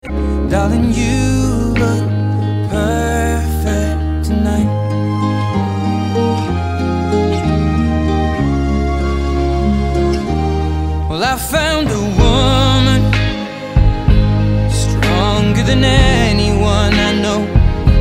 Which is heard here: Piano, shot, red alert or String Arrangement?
String Arrangement